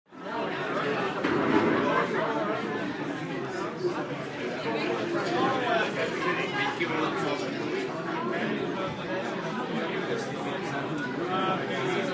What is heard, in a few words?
Large Busy Pub; bars & discos